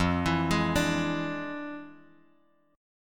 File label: F Minor Major 13th, Bass Standard